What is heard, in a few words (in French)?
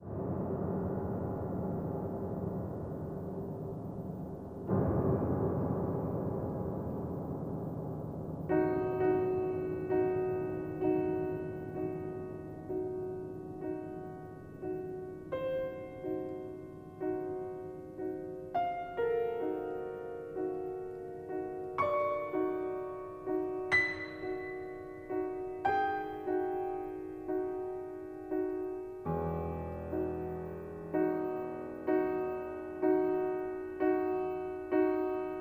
pour piano; RTBF